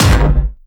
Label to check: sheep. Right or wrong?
Wrong